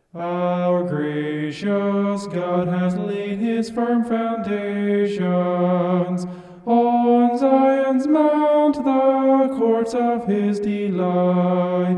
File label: vocal homoph; psalm 87